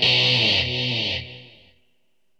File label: GUITAR, ROCK POP; MAGIX